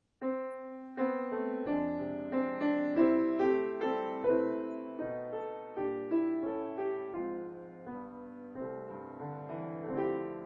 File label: Christmas song, flute piano